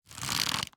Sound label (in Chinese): 弩快速装填一阶声